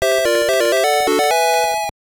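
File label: Jingle, Game Boy Color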